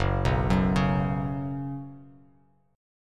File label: F sharp Suspended 4th, Bass Standard; arpeggiated; F#sus4